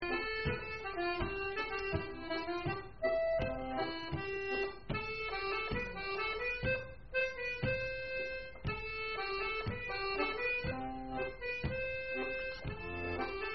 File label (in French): Polka; rencontres-2014